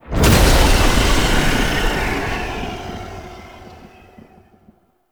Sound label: Rune Despawn; Warframe; Entrati; Mirror Defense